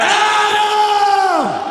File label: screaming; roderick strong